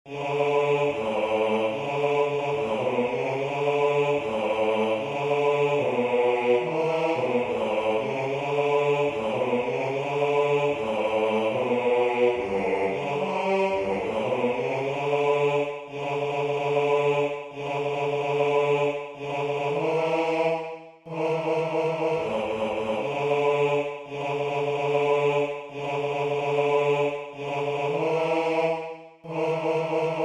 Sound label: bass